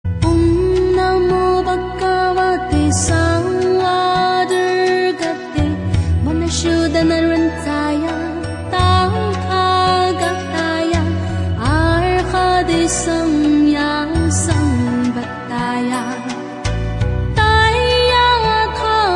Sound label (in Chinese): 釋迦牟尼佛滅一切惡趣王咒 - 女聲; 釋迦牟尼佛 Buddha Sakyamuni; 又名 毗盧遮那佛心咒